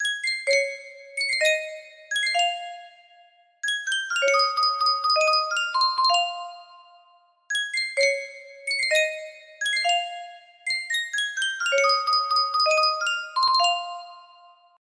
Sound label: sankyo custom tune music box, music box melody